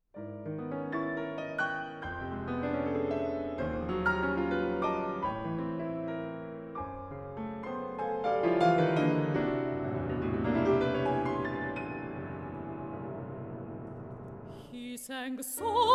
Over the Piano

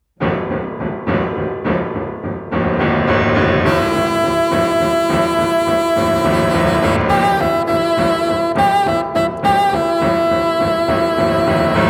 Rondo capriccioso; Barkauskas

